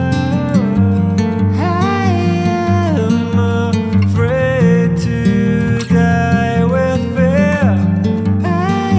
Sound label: reflections acoustic